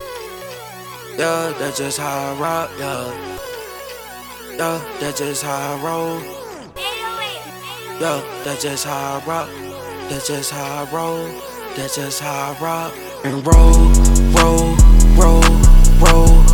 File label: rock n roll